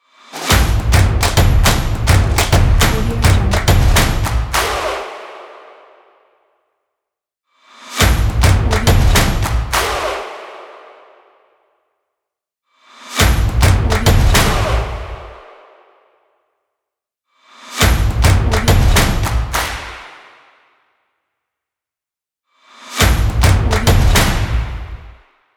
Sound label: stomp claps energetic logo